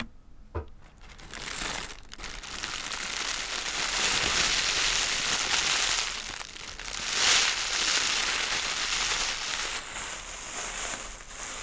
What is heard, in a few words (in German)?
tuete; roland r-26 testbericht